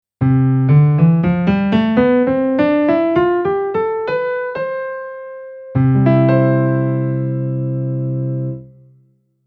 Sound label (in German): temperiert tonleiter